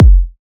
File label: tight low end kick sound F sharp key; kick drum; kick drum samples